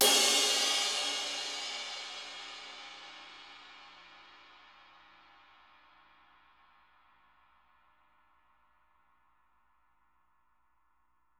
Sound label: Drum Kits; Sonic Reality